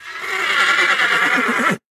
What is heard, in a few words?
Horse neigh angry and nervous